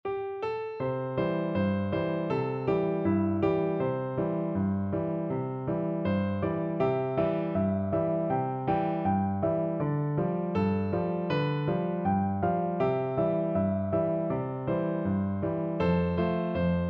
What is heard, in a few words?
coming round the mountain, piano duet